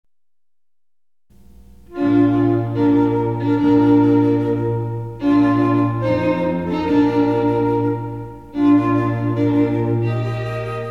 Bridal Chorus, Wedding March; from Lohengrin

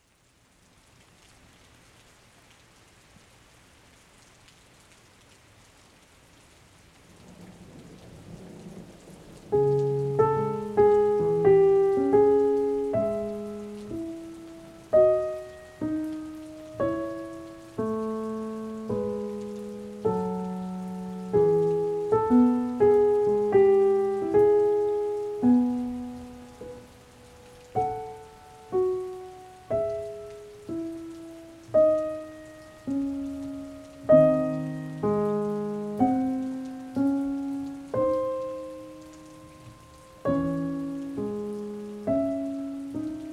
Period Piece (Rain)

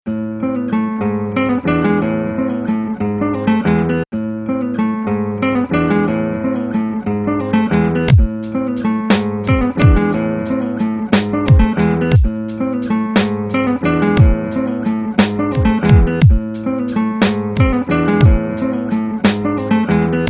Croatian Beat 118bpm

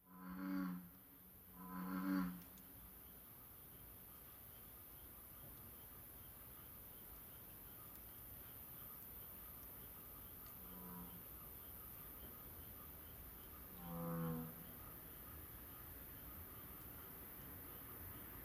Bullfrog

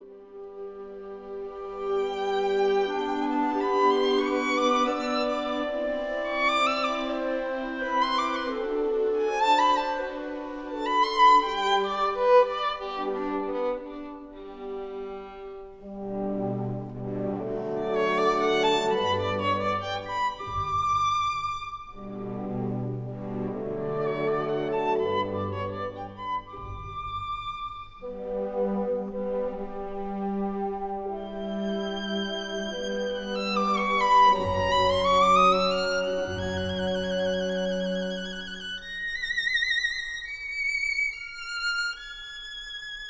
Violin; Beethoven Violin 1; Royal Philharmonic Orchestra; Serebrier

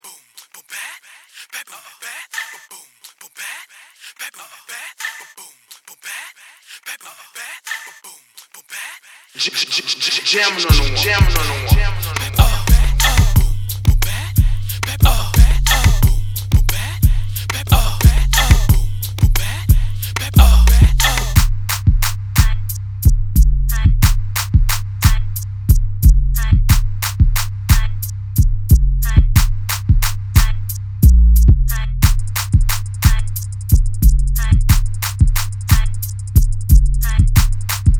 Boom Bap

